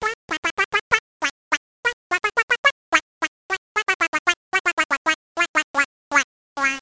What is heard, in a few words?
duck; My Science Fair